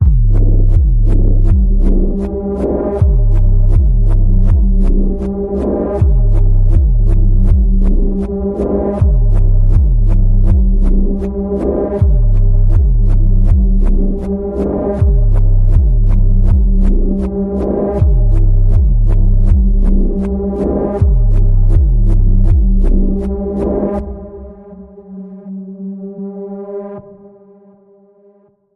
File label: Panning Pulse G, Key of G